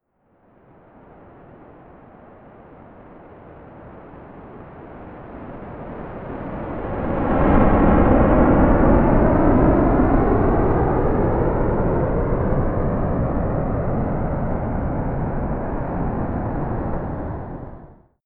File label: military jet rafale flyby fast distant